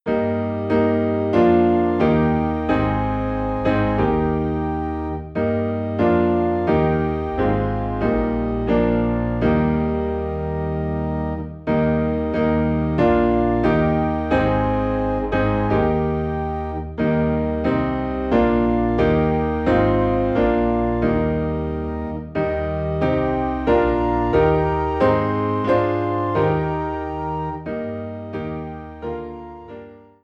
Piano and Organ in E Flat; We Believe In One True God

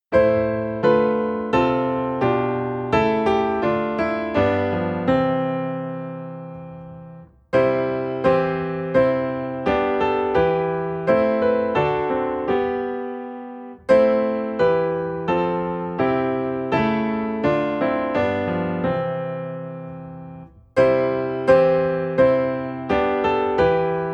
Piano in C; Each song includes a last-line introduction and all verses.

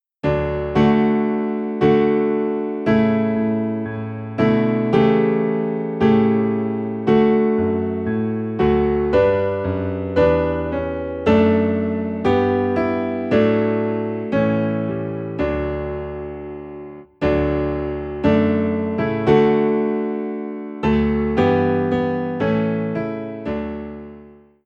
Piano - Low in D; And Can It Be

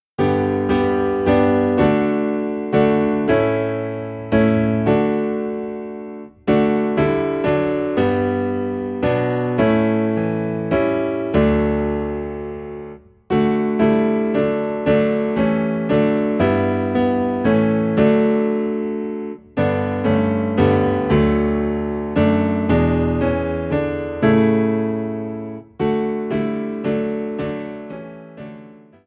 Piano Low in D, hymns; Faith of Our Fathers

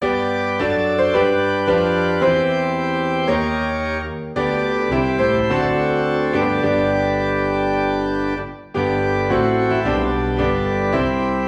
Piano and Organ in G; By the Sea of Crystal

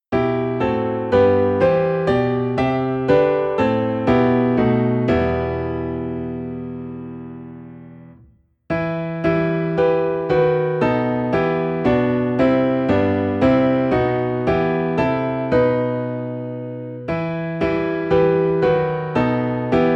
Piano in E Minor; christmas; God Rest Ye Merry Gentlemen

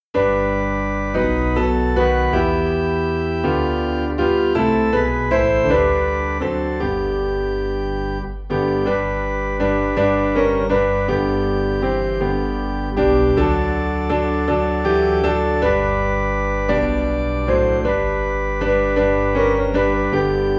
Piano and Organ - High in G; Oh How I Love Jesus

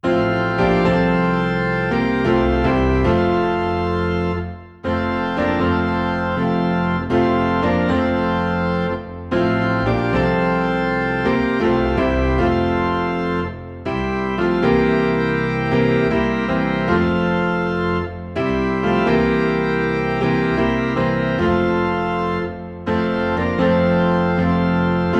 Piano and Organ Low in F; Rock of Ages

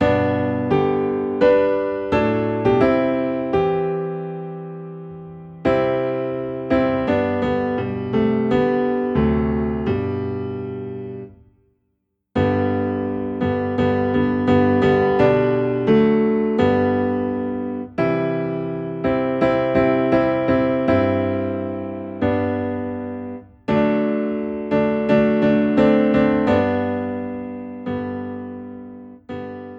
Piano Low in G; God be With You

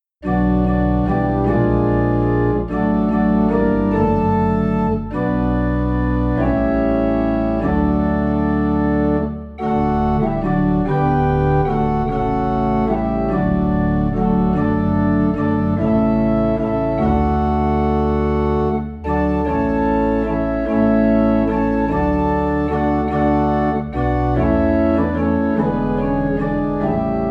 Organ Low in D; Jesus Is All the World to Me

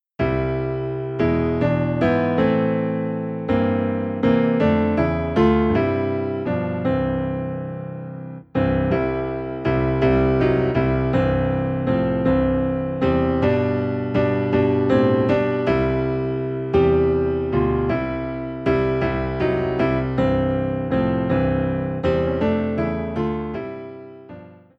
Piano - Low in C; Oh How I Love Jesus

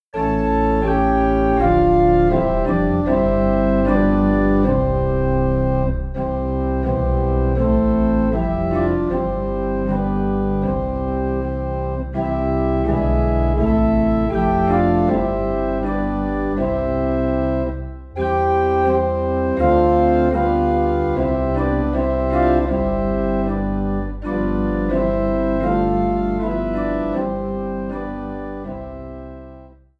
Organ Low in C

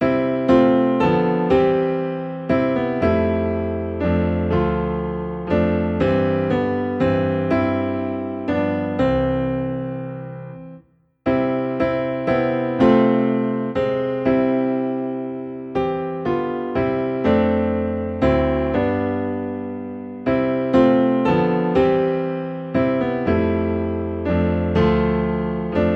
Piano Low in C; Breathe On Me Breath of God

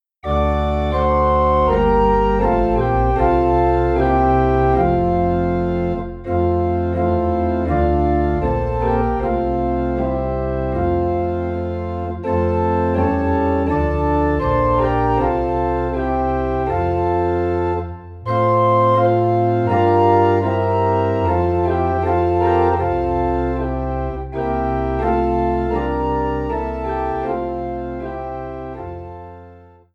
Organ in F; Christ Whose Glory Fills the Skies

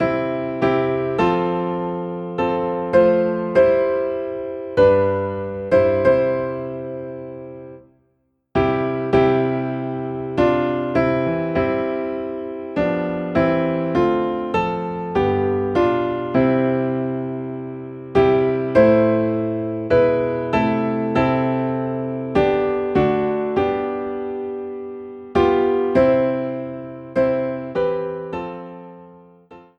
Piano in C

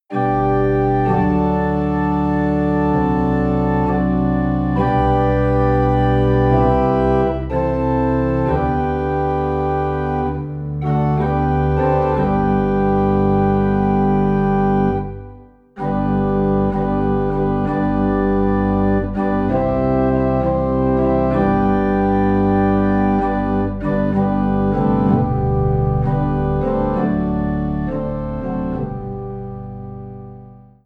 O Holy Night - Organ Low in C; christmas

